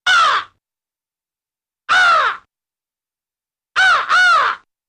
Crow Caws; birds

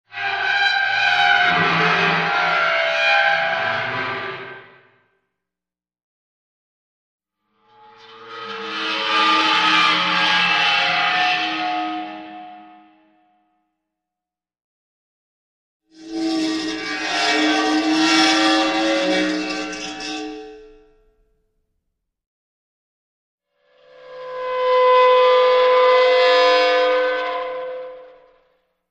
Dry Ice Eerie Metal, dry ice

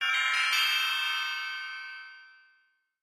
Music Logo Hi Chimes

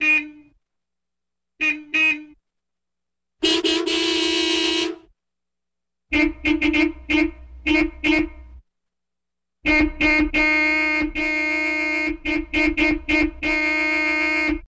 Car Horns Vari Mono

